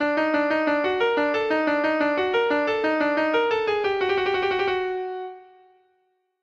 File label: ringtone cockroach